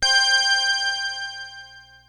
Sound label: PPG C5, Synthesizer; Hollywood Edge; Giorgio Moroder Rare Synthesizer Collection; PPG.2.3